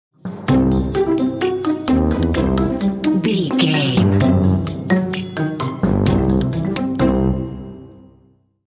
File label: silly fun stinger